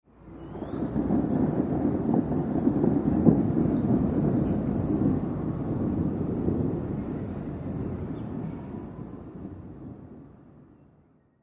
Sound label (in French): orage